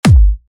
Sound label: Kick Trance